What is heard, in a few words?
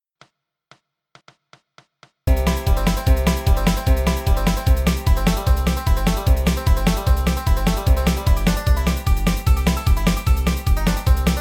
Bluegrass Banjo fiddle C; Kansas City Railroad Blues